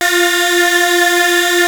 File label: Prophet 5, F5; Hollywood Edge - Giorgio Moroder Rare Synthesizer Collection